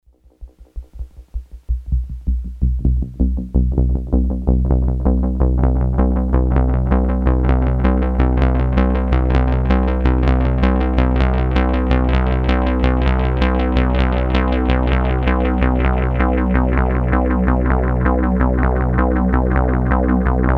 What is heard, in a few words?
Synth1